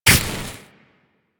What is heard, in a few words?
Laser Impact; Warframe; Weapons; Corpus; Crewman Laser